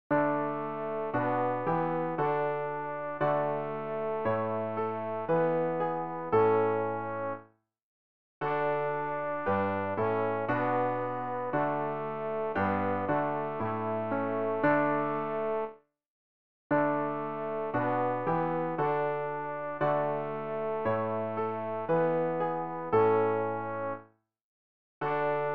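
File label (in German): tenor, Übehilfen; Ref. Gesangbuch; RG 092: Mein ganzes Herz erhebet dich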